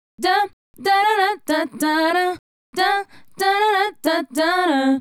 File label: Female Group, Hip-Hop and RnB Vocals